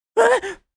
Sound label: Scared anime boy vocalization